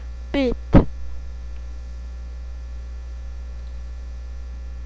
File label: beat